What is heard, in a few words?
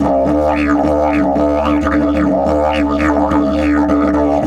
108, DIDGE 108BPM